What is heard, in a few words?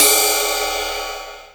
reverb crash cymbal single shot C# key, drum crash sample tuned to the C# note